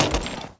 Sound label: grenade hit; weapons